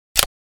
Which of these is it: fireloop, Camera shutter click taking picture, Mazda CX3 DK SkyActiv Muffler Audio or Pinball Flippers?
Camera shutter click taking picture